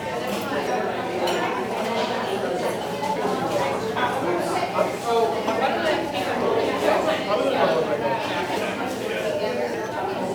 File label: Diner Amb Mono; Levels Hub Diner